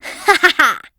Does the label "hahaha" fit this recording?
Yes